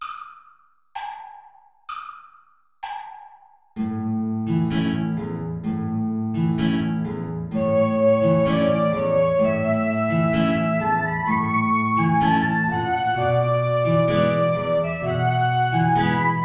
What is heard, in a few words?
tango play along without soprano saxophone; albeniz isaac